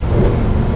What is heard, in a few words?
3000GT